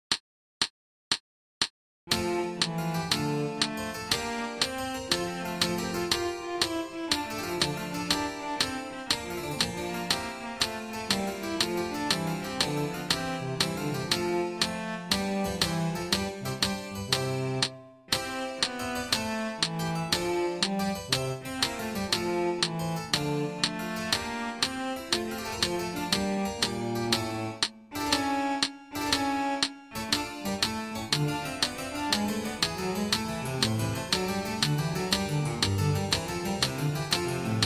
giga no repeats full tempo no solo

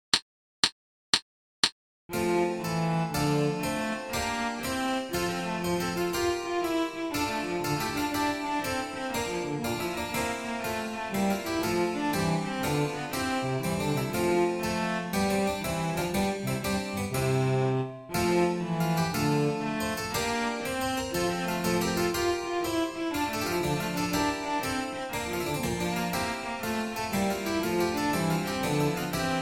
giga, repeats full tempo no solo no clicks

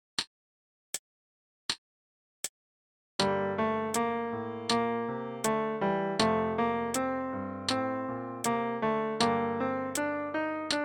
slow with solo

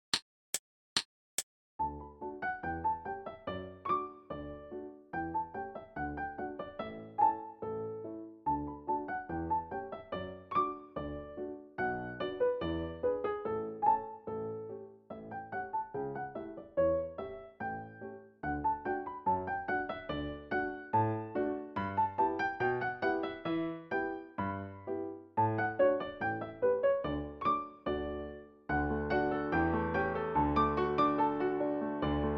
gavotte, no repeats full tempo no clicks

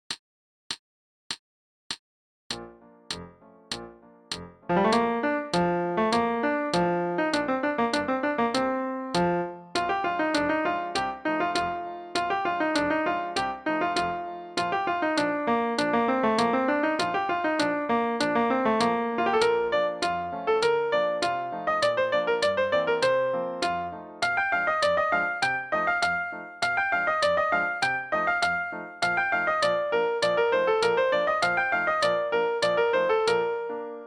norwegian dance full tempo with solo and clicks

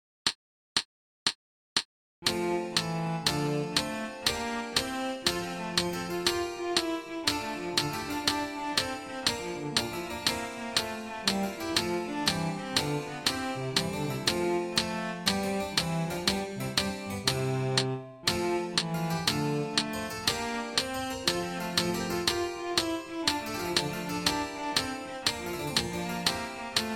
giga repeats full tempo no solo

